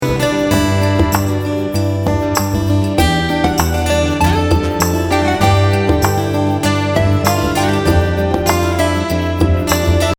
indie electro

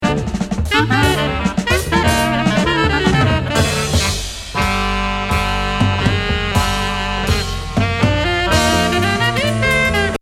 free jazz

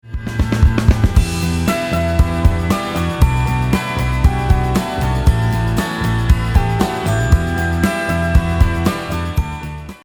powerful and energetic, music

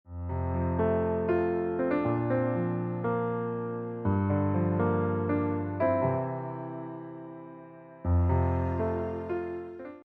tempo low, music

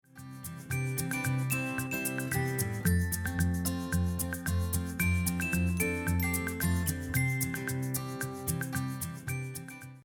clear and comprehensible, music

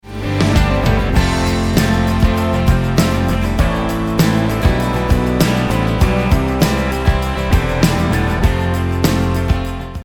powerful and energetic

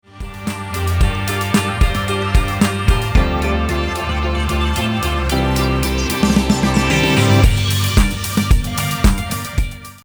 indie electro

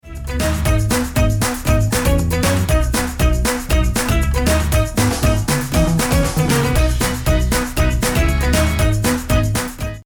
pop

